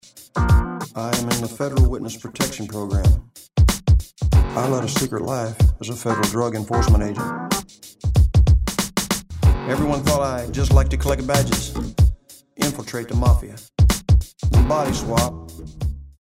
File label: Rap